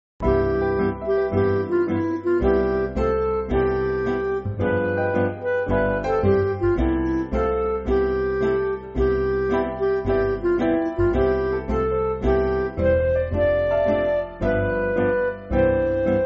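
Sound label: Midi, Backing Files for Churches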